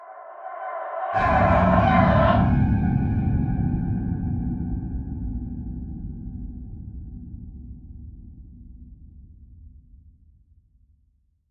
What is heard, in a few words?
Spooky Sound